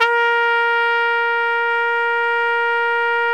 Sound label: SOLO TRUMPET, Pop & Funk Brass; Best Service ProSamples vol.25; AKAI